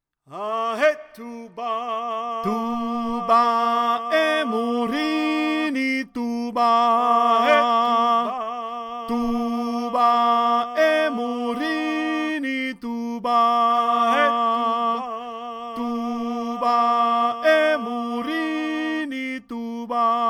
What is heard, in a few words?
tenor